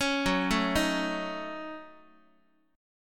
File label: F sharp Minor 6th F sharp Guitar; arpeggiated; JGuitar